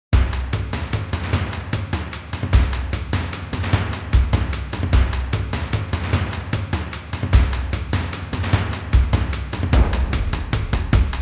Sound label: Mid Drums